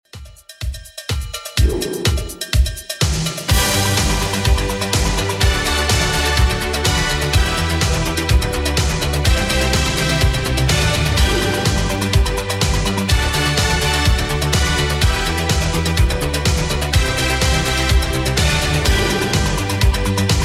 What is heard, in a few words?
70s Redrum 125bpm